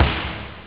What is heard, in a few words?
rock, drums snare; OctaMED SoundStudio V1